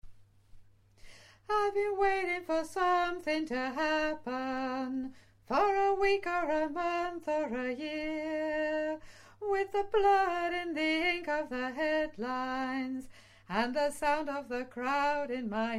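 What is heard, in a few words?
solo soprano & alto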